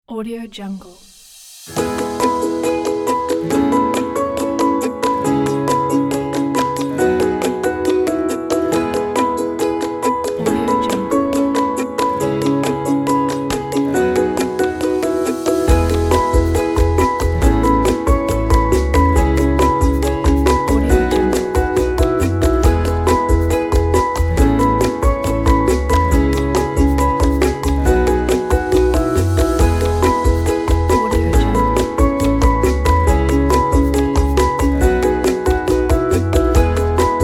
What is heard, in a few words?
fun fresh and inspirational ukulele